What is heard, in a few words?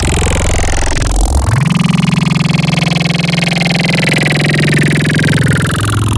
UFO, wavetables; Cymatics; Wavetables Collection Vol.2; Serum